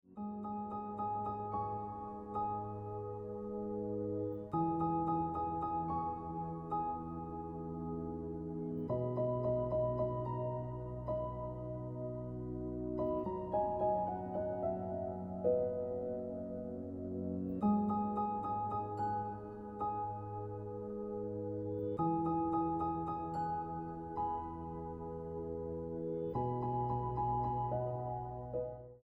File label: Piano Music